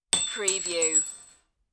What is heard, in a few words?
key drop